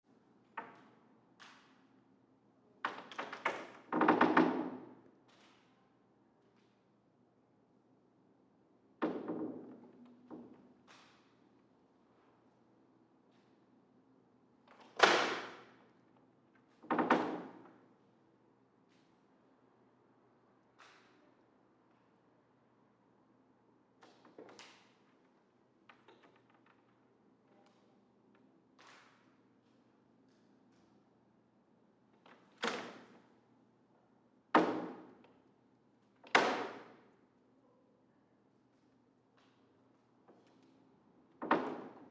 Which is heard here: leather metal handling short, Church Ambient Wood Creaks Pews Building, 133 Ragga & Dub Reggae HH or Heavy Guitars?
Church Ambient Wood Creaks Pews Building